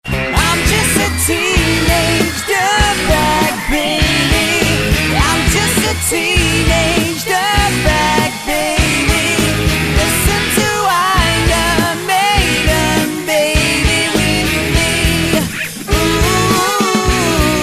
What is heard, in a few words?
Electric Band